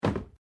Cardboard Box On Box Stack; Material components for constructing more complex sounds

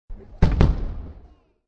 Artllry Two Sht, Artillery two shots.; War

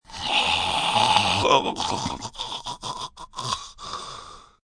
Snore Choking, Snoring, male, choking, comical; (human voice); Voice Box

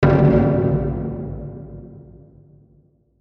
Hit Drum Low; Hit Drum Hollow Reverb; Blastwave

